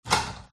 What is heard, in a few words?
open door cupboard; fox audio